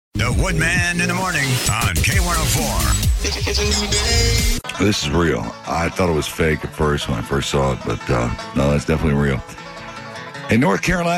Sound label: chicken laugh